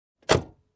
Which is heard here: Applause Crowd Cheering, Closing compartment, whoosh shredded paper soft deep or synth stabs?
Closing compartment